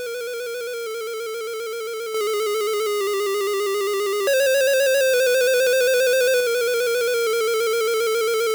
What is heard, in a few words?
Nightmare Shadows Warning; Zelda